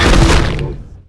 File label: d hit; openffa; boss3